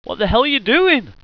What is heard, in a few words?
hurt